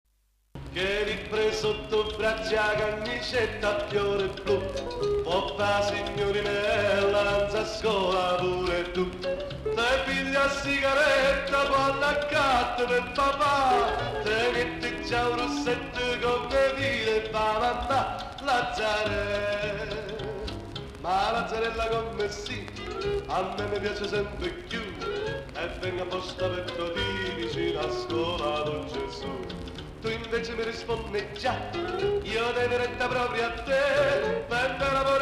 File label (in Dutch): Italiaans liedje; Italiaans volksliedje